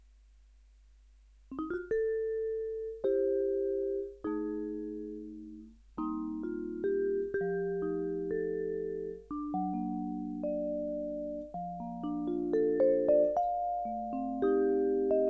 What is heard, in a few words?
pickup preamp